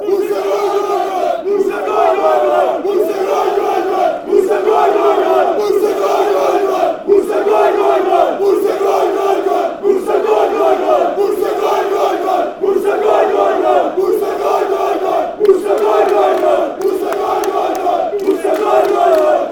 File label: goal goal goal, fanchants; bursa